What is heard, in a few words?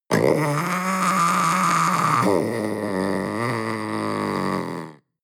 panther young cry long